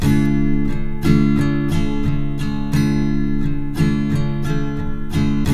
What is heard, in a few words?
TK MIN D#3, Guitars; Spectrasonics; Hans Zimmer Guitars VOL-1